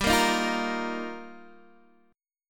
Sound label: G Minor 7th Sharp 5th, Guitar, Open D